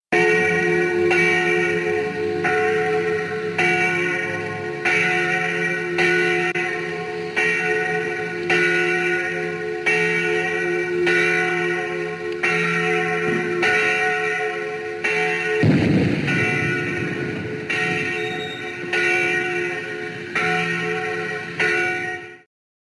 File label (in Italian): campane di san michele; campane s michele 1980